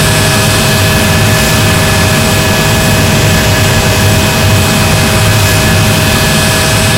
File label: jet side, engines; War Thunder